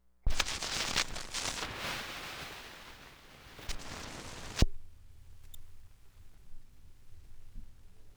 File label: needle drop; audio snippets from the cutting room floor